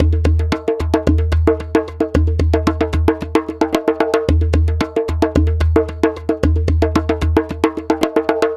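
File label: percussion, Roots Funk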